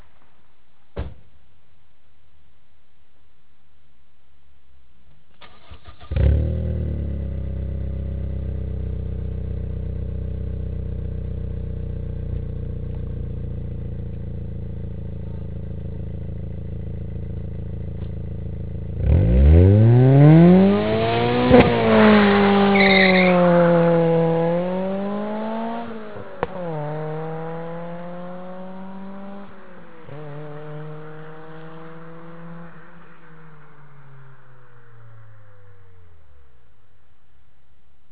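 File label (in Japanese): n1, 101TRUENO+A'PEXi N1 スタートダッシュ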